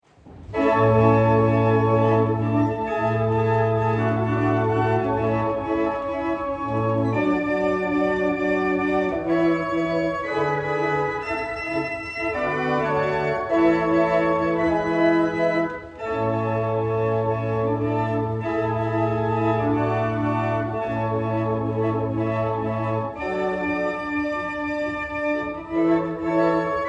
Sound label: Double chant, barrel organ; Norton